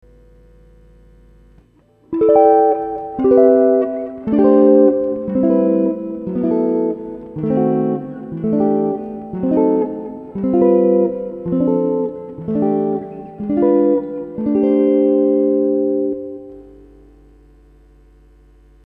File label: Chords No Backing, guitar